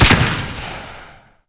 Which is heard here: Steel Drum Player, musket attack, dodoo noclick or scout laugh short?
musket attack